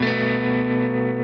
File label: F#m7 Arpeggio down